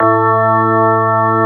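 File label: CX3 organ A2 L, Keyboards Old School; USB Soundscan vol.09; AKAI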